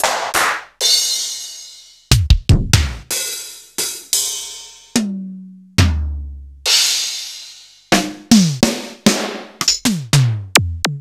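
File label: Roland MV8800 drum 90'S XR KIT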